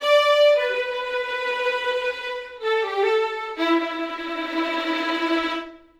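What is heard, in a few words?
violins DnB Em 160bpm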